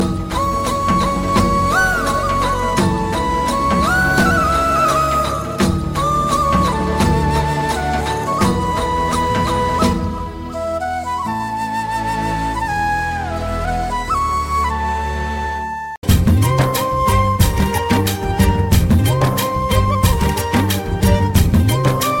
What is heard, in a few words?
FLUTE FUSION RINGTONE